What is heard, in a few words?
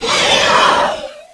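hvoice wavetable; monsters infect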